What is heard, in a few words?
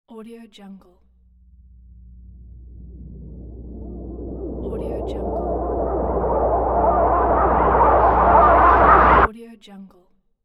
cinematic suspense build horror voices